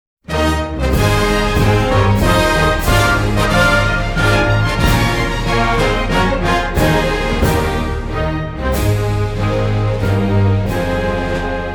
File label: star-spangled banner Ab no vocals